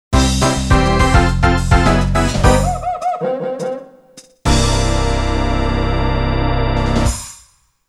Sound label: Interlude game over; Mario Kart 64